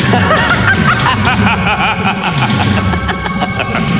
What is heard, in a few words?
laugh; doom